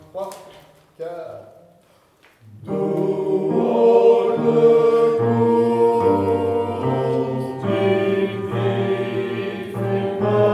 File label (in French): Tenor Basse